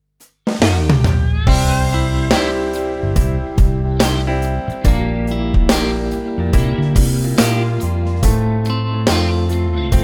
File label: acoustic pop